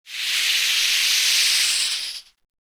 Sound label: spider death; granny; zerosiki; slender